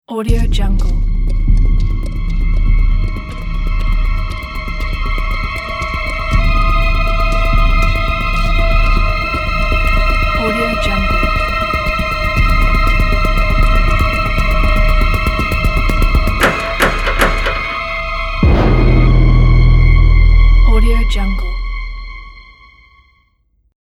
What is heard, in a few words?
scary logo intro